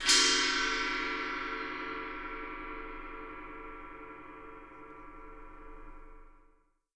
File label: GONG SCRAT; Miroslav Vitous - Percussion & Harp